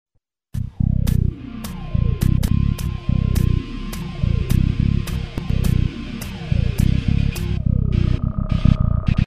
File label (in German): musik